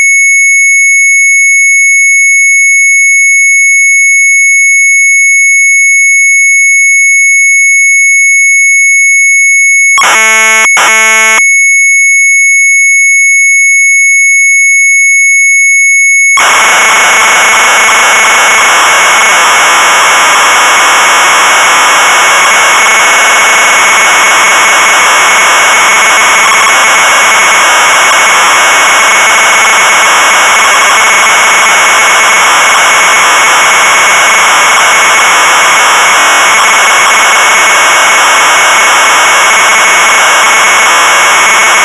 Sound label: MZ800 Tape, sharp; retromelsarchive